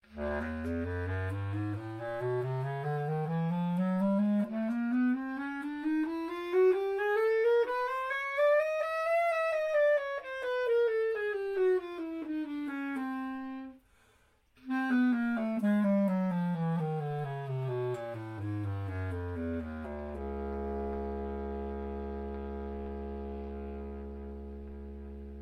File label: clar